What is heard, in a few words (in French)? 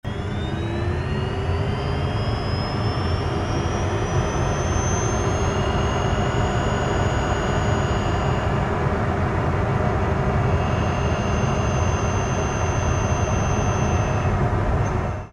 ebike roule; La-Fabrik